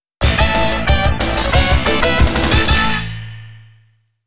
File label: Goal; Taito Digital Sound Archives Arcade Vol.1